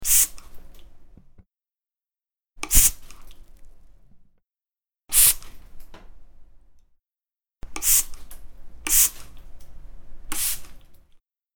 spray

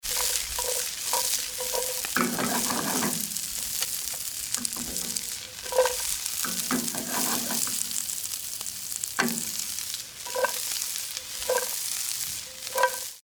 vegitable stir fly

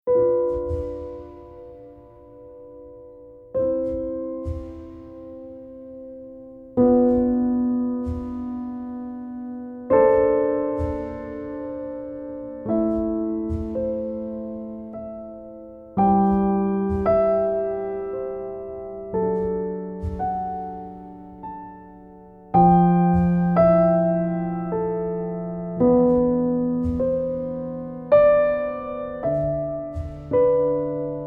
piano quiet

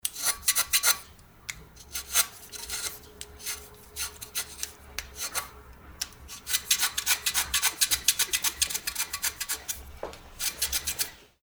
craftsmanship

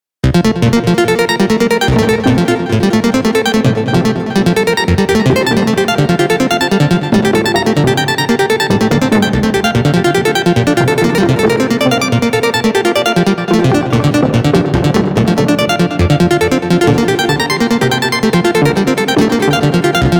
synth texture

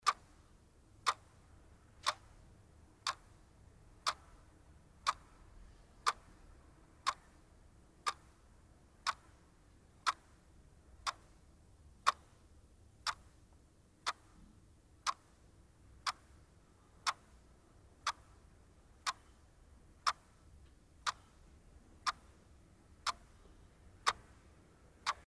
clock

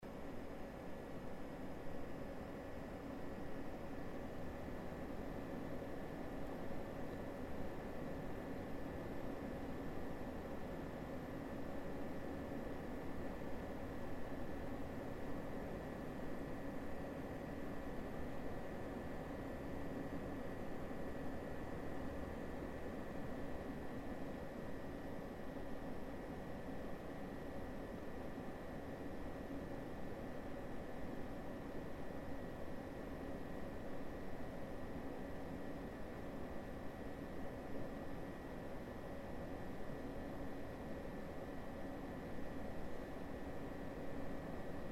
PC machine noise

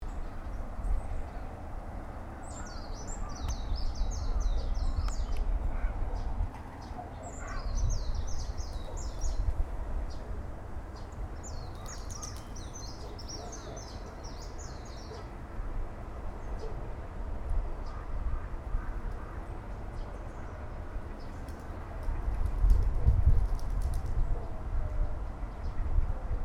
Atago Shrine

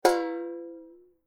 pot hitting

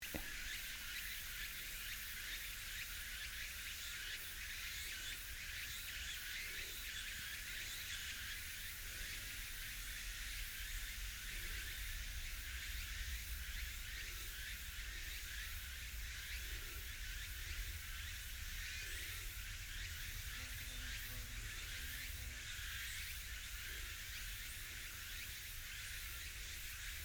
Nakagawa Forest

